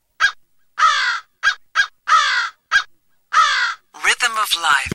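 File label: crow message tone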